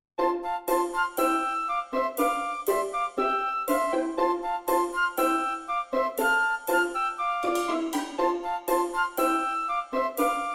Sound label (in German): Schmetterlinge flattern auf und nieder Karaoke